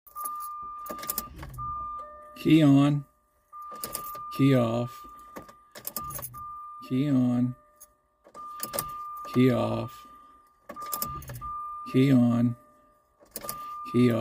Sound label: crank no start, ultra weird fuel pressure readings; x-type x400